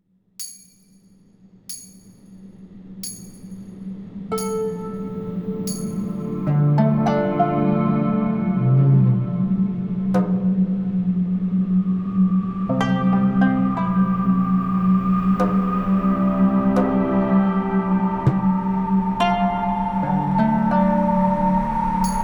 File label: Transition; Ozymandias